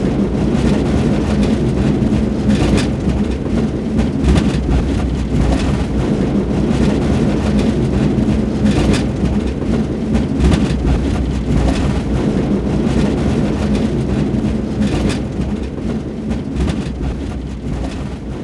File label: Sfx Bowling